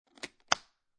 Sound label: Guitar Case Close Single Buckle, Foley, Guitar Case, Closing Single Buckle; Latch; Latches; Objects